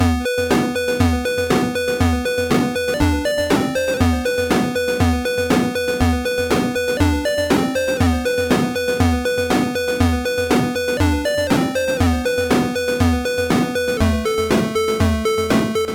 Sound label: Chiptune Loop